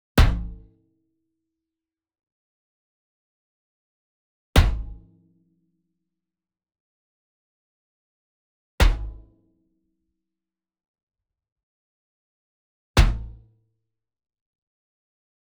Sweetener Metal Bin Soft Hit Near; boom library; Cinematic Strikes Construction Kit

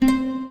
PUZZLE Success Guitar Very Fast Two Note Dry; stereo; Universal Sound FX

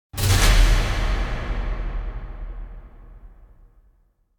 Impact Heavy Blow Strike; Hollywood Edge; sound designer's toolkit v2

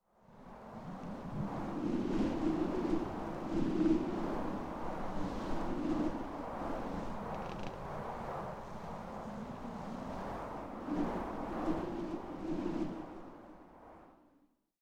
Wind Gusts Cold Whistle - Lower; Hollywood Edge; sound designer's toolkit v2

